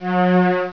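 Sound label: slow strings; Prince of Persia 2 Demo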